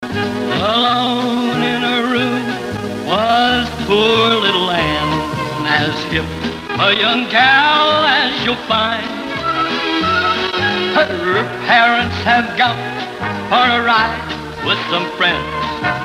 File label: jingles; crc tune toppers